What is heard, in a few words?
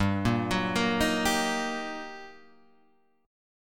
G Minor Major 7th Sharp 5th, guitar chord; GmM7#5 Chord {3 1 4 3 4 3} arpeggiated